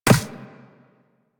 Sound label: Walk Ice, footsteps; Warframe; Operator